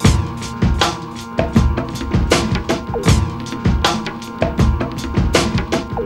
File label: loop, breaks